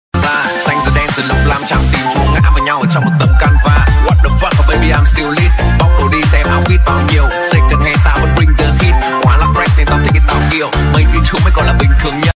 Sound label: remix